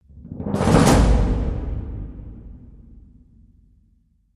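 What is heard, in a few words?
Crescendo Tight Roll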